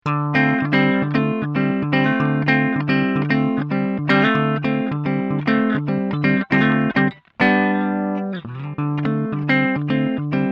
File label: Pedaltone